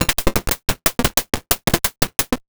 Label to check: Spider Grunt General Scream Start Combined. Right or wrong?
Wrong